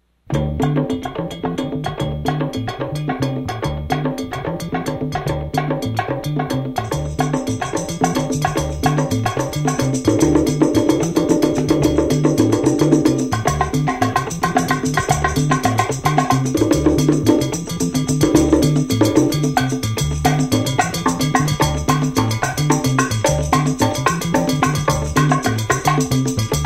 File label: percussion; Abacua